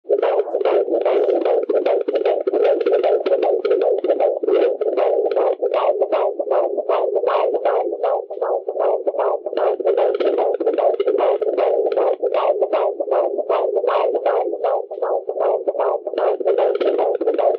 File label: baby heartbeat